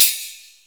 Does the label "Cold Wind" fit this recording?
No